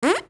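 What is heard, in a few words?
FX Zipper, Beat Box; aawaz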